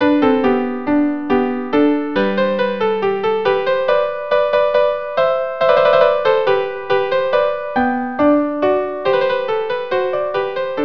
rondeau viola duo; mouret

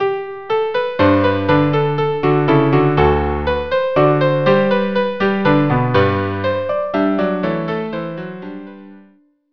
Minuet

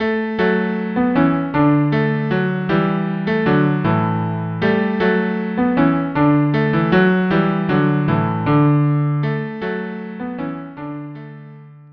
cello duo

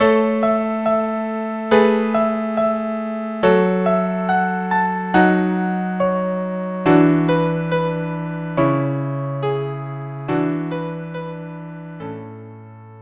trio

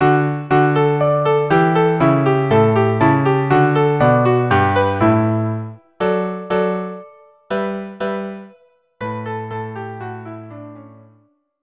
haydn, string trio; three german dances

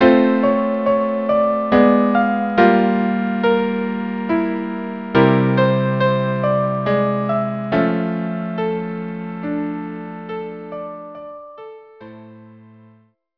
Intermezzo, incidental music; St Agnes Eve; abridged string quartet

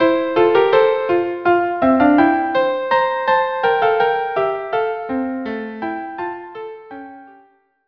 Gaillardise violin duo

